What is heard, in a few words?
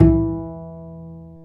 Bass, Roland